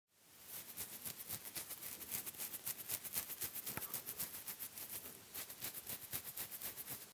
Scheuern

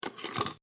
Metallverschluss oeffnen

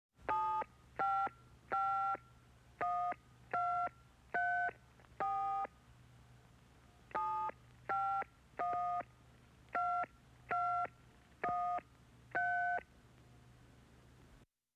Im Buero Telefon mit Tonwahl

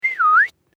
vox pfiff

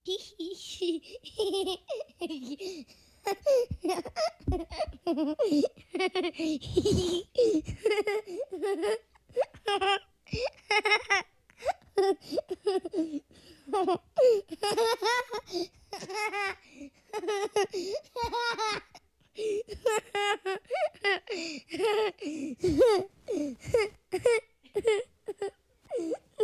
Lachen Maedchen

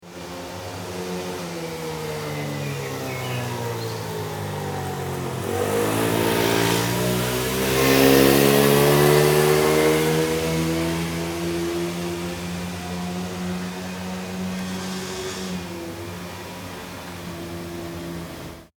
Strasse Mofa

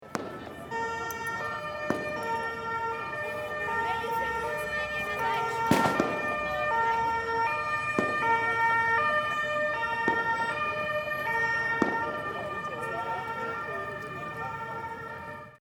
Silvester Martinshorn

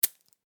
Klebeband

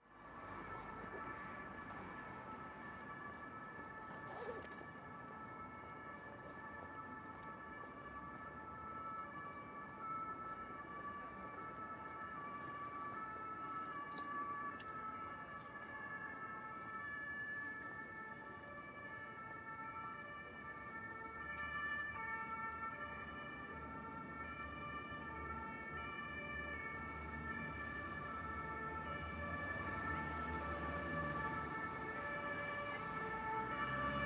Polizei von weit n nah

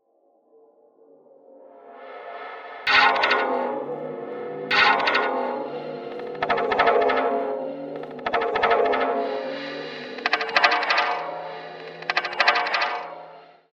Vorhaengeschloss

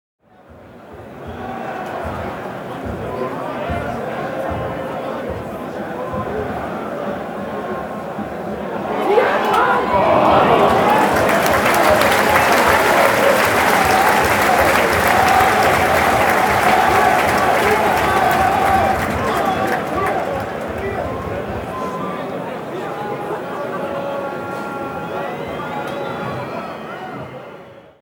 Stadion Fan und klatschen

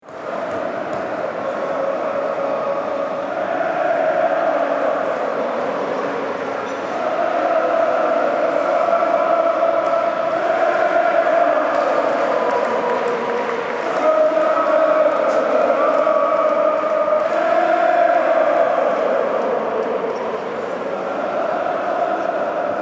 Fans im Bahnhof

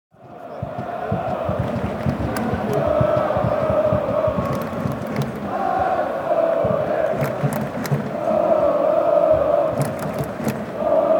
Stadion Atmosphaere

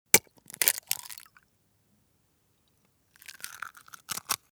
Ei aufschlagen

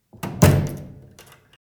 WC Tuer schliessen

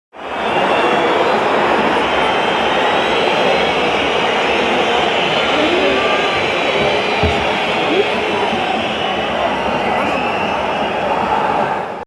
Stadion Buhh und Pfiffe

